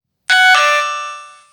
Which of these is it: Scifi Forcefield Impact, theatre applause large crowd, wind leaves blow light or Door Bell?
Door Bell